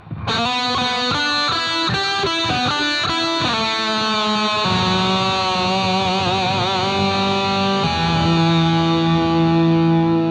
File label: ibanez pia; blue powder